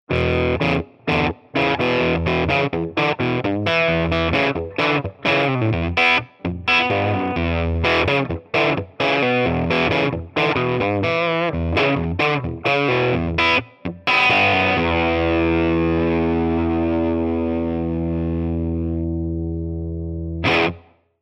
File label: Les Paul swampy blues; Tone Monster